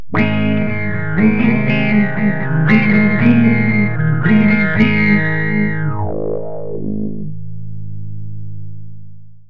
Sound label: env chords